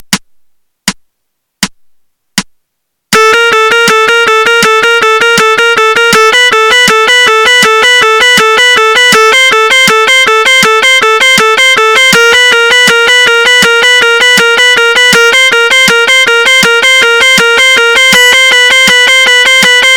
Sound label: legato exercise, guitar